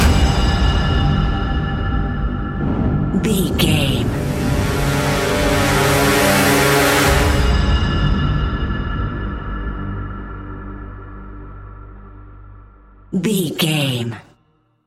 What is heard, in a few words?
suspenseful echoes resonate